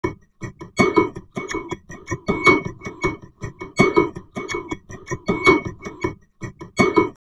VOCOD, Drum Loops Crazy Processed

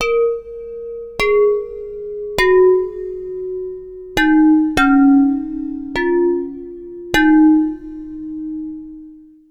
Slow Clay Dish; Ambient; Melodic; Ambient-Melodics

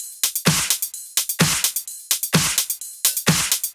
Loops 128BPM, Drumloops

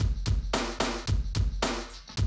Classic DrumLoops

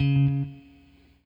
ROCK, Guitar

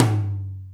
Alesis D4, Drum Machines

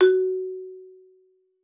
marimba F#4; Xsample VOL-4

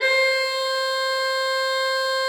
C5, Cathedral Organ, HOLZPRINZIP; Propeller Island

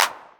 Clap, Novation Drumstation

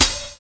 FAT DISCO; Univers Sons

